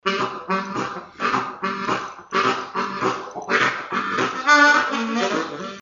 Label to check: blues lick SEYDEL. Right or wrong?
Right